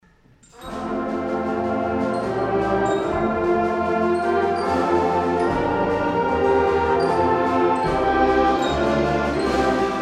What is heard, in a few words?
Welsh Chorales, chorale; Three Welsh Chorales; Hyfrydol